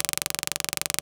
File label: rotor lego